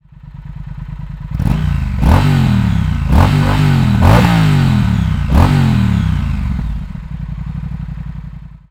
Speed Twin Opened, TRIUMPH

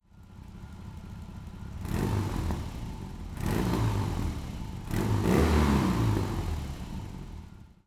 BMW R18 short dynamic

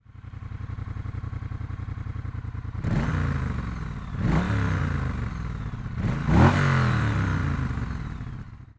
Bobber Closed, TRIUMPH

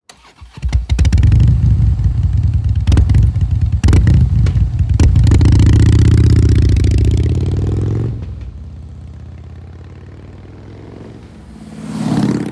softail v strike open

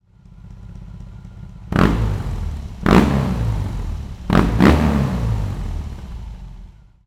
BMW R18 short opened

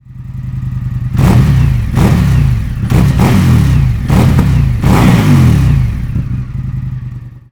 FTR Opened; INDIAN

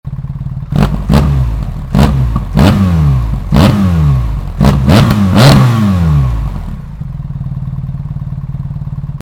BMW R 1300 GS; Mr-Hyde mode

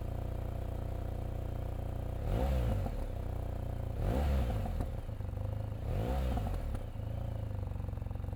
BMW GS Dr Jekill Mode

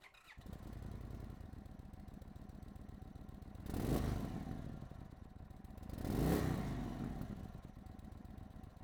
Indian chief Closed

